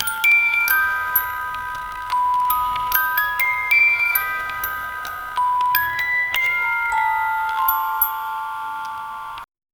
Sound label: ambient echoing mechanic, music box